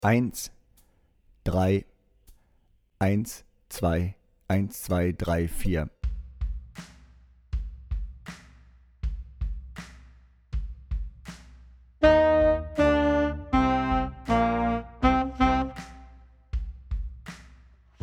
Power Rock Tenorsaxophon lauter

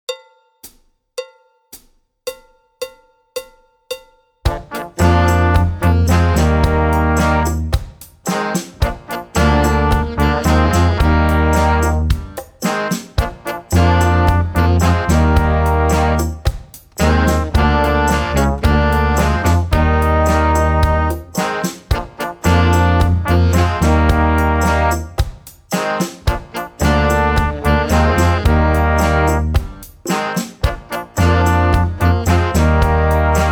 Melodie Tuba

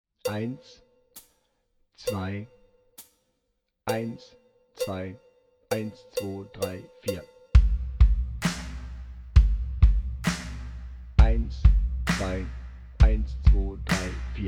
Power Rock Playback langsamer

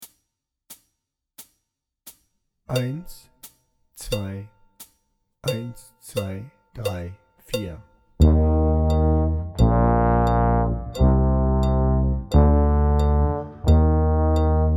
March ohne Drums langsamer

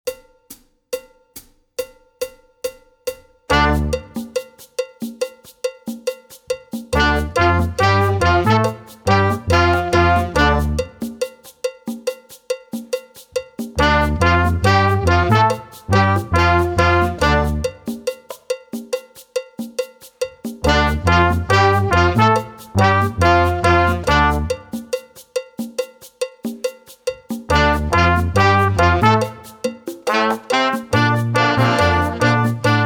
Happy Melodie ohne Drums

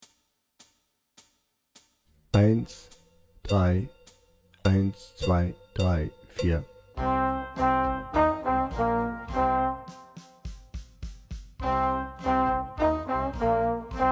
Hard Rock Blues Horn lauter langsamer

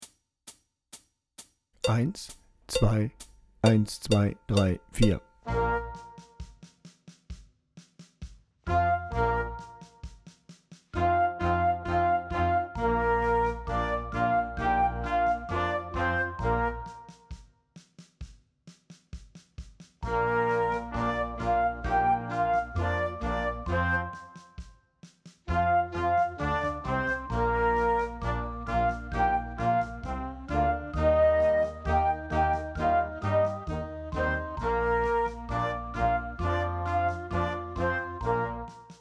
Lets Rock Floete lauter

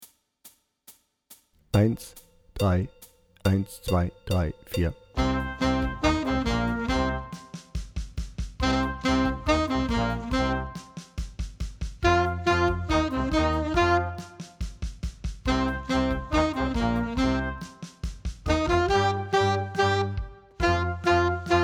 Hard Rock Blues Altsaxophon lauter

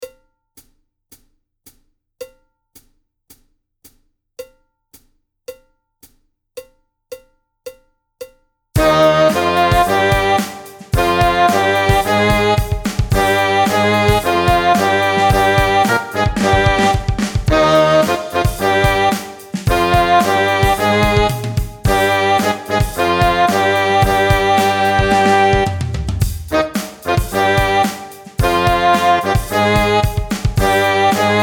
Zweistimmige Uebung nur 2. Stimme, brass; Brass Online – Grande Fanfare

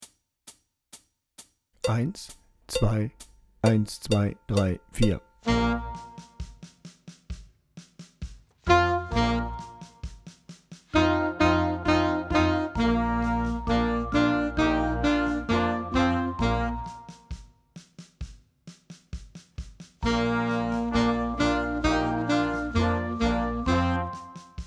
Rock Altsaxophon lauter

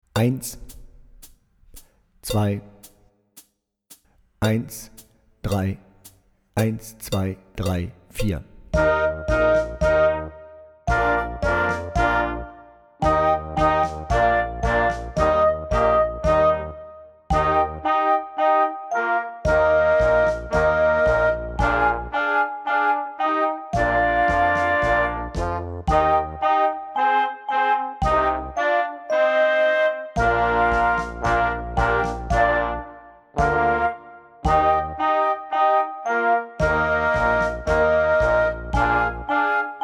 Playback Floete Oboe Glocken lauter

